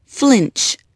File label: flinch